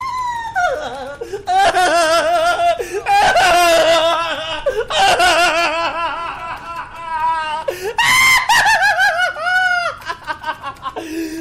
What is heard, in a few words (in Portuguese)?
risada